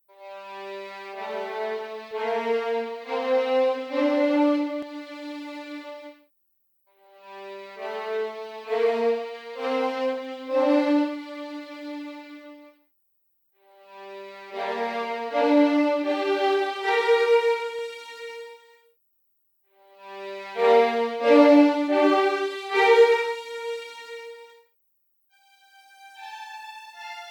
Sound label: First Violins Test Legato Close; LSS